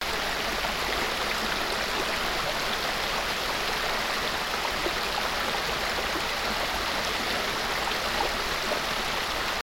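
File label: water stream water flow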